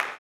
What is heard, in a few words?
CLAP, Blues Soul; Spectrasonics Vocal Planet